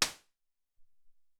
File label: Noiz Hat, Alesis SR-18